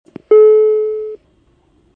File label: door chime